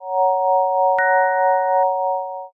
save point

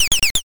bird scream

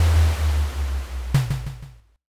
drawbridge

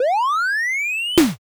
defib

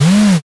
servo

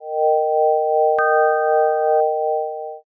save point

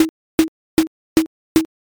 mokugyo

